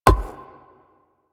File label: Grenade Land; Warframe; Vehicles; NechroMech; Abilities